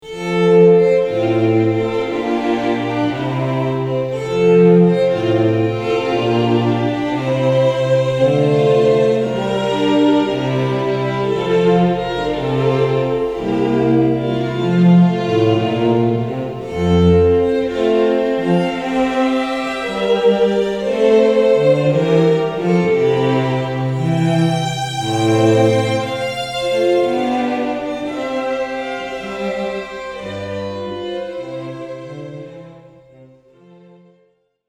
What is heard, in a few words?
Quartet; Air from Water Music; Handel